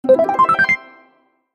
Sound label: small win